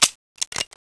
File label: python empty, weapons